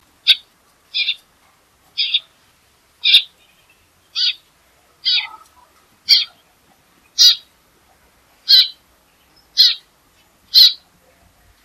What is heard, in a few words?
Eurasian Tree Sparrow calls